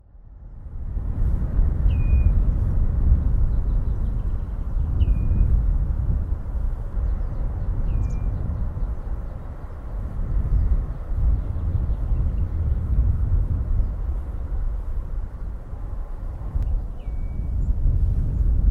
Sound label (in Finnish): punajalka, linnut